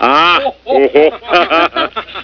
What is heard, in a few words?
ahhohho; caddyshack